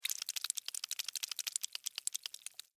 Insect Walk Wet, Insect, Walk, Wet, Slimy, Movement, Bug